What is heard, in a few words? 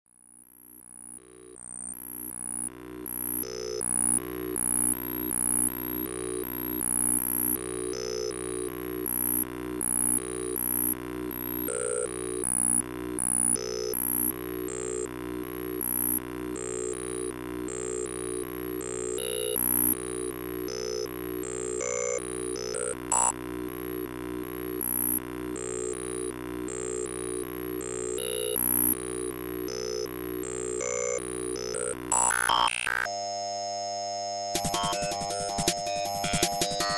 progress of an electric beam